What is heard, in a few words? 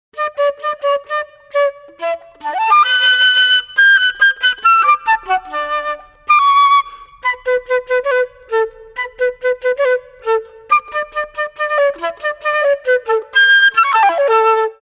flute